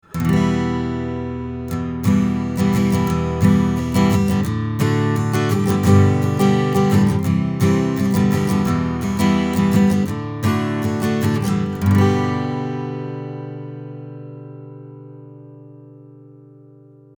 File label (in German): DPA 4060 Gitarre Kugel 19ter Bund